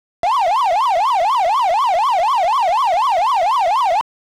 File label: Ghost - Spurt Move; super pac man